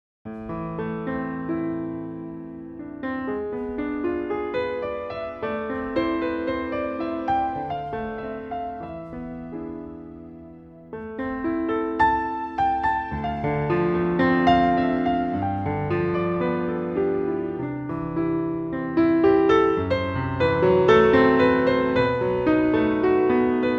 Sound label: Improvisation